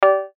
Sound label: CHIME BRIGHT SOFT; Multimedia; Chimes; Blastwave